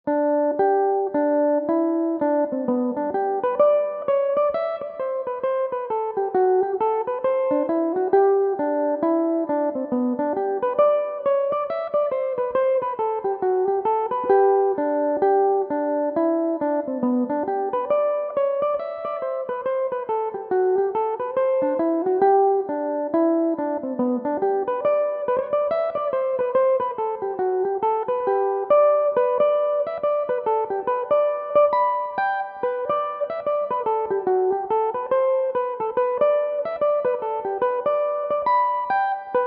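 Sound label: G major, Irish mandolin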